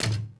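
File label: droid bodyfall lg dirt; droids robots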